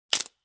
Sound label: Twig Snap; Environment